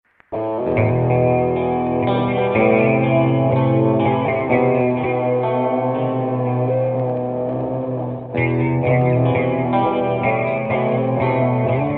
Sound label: Solo